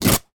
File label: foley cloth rip; sound effects one